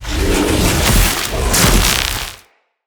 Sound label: Casting Device Finisher Back; Weapons; Player